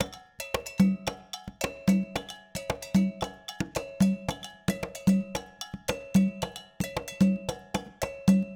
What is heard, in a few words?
Agogo Atabaques 112 bpm; Loops; Drums; Percussion; Ableton Live 12 Lite; Core Library